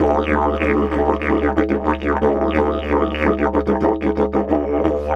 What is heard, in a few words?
Didge 93 BPM, Didgeridoo; Big Fish Audio - Didgeridoo and Other Primitive Instruments